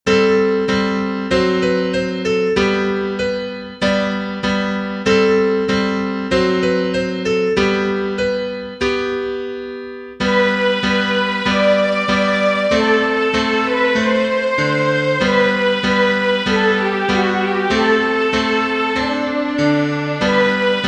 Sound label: Wiegenlied (Schubert lullaby)